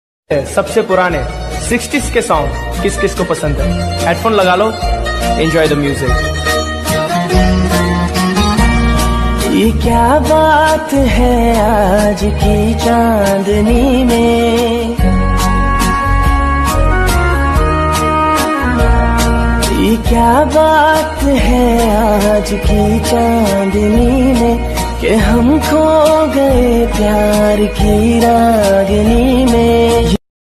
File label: old music hindi songs